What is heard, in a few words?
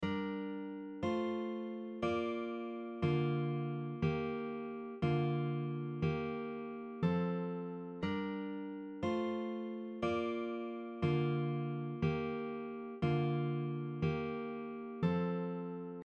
Spread triad, guitar; Spread triad chord example 10